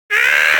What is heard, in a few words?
windup